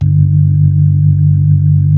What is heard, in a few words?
B-3